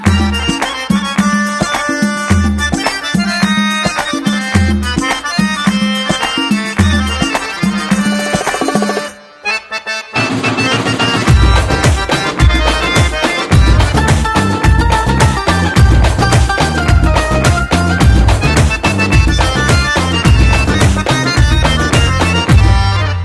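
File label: Instrumental Tone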